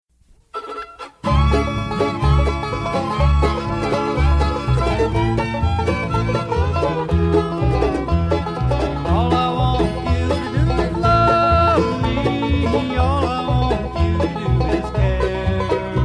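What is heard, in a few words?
fiddles, guitar and singing lead vocal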